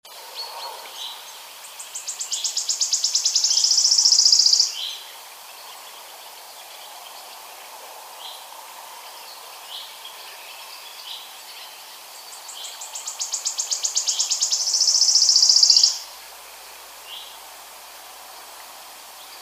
Phylloscopus sibilatrix

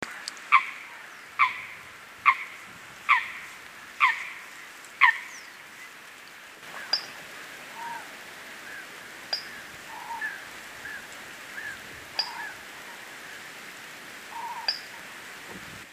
Fulica atra

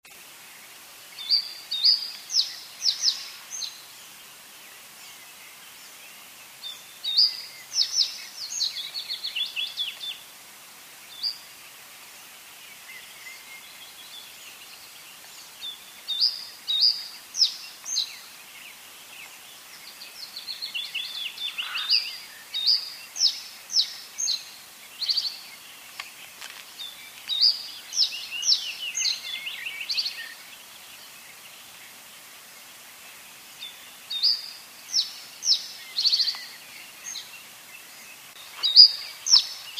Emberiza schoeniclus

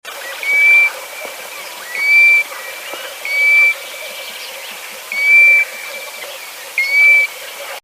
Pluvialis apricaria

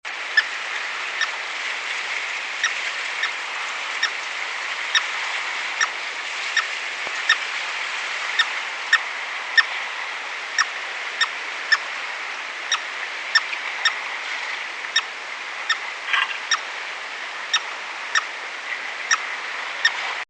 Picus canus

